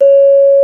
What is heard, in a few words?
GENDER, Bronze Gamelan